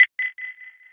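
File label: detonation pack timer; tf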